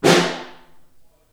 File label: SN Far, Snare; Ableton Foundation FreePacks; Snare Designer